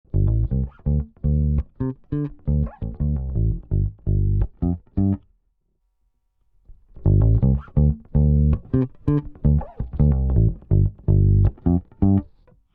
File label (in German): Bass Clean ohne und mit Comp; Quad Cortex